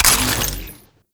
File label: Ammo Pickup Magnetic; Ammo Ability; Warframe; Warframes; Frumentarius